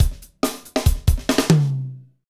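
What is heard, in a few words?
beats funk, Roland; Northstar - Drumscapes Roland; Uptempo Funk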